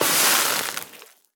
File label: Laser beam damage; Likho; Folk Hero